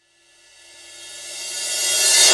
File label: Reverse Cymbal; Drums; House; Best Service; House Definition VOL-1; Cymbal Stuff